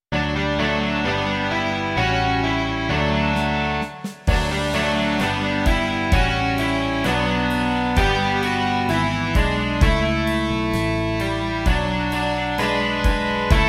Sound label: rock